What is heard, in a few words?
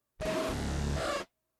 video static distortion short bursts; rizzy; prosoundfx